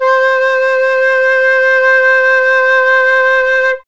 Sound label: flute C4, Classic Orchestra; Best Service ProSamples vol.51